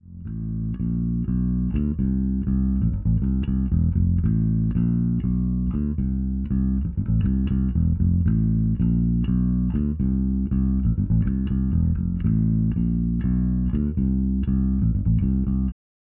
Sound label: Zoom B2 Four AC 370